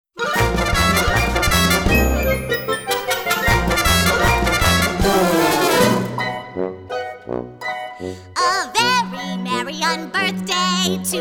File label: Guided Vocal